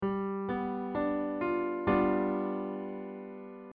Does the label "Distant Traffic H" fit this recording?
No